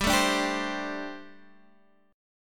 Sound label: G Suspended 2nd Suspended 4th, Guitar Half Step Down